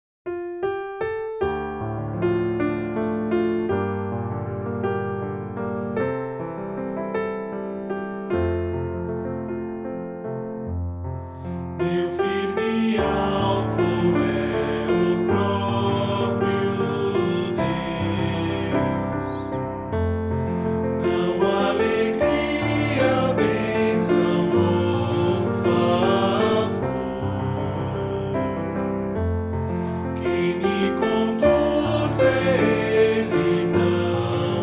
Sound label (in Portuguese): voz piano